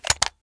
pickup grenade

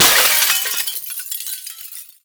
break glass phys large; physics; breaking

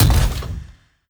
construction step, strider; creatures; Half Life Model Pack

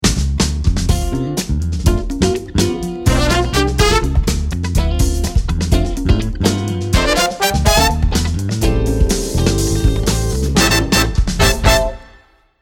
start action, music

